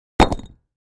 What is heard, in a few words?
Patio Block Drop